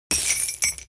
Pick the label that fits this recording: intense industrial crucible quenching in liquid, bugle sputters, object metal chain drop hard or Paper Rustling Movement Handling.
object metal chain drop hard